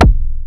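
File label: PULSE DNC, TECHNO TRANCE; Pro-Rec - Synthsonic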